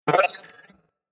Computer Interface Telemetry Robot Scifi Beep Interface Delayed Space; Tom Hutchings